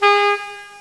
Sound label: marine, Whistle